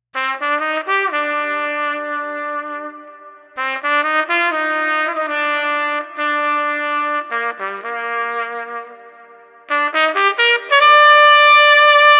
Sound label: Trumpet Airy Somber 70